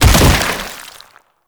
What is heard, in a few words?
Bodyfall Light; Enemies; Warframe; Proto Infested